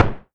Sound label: turret shoot far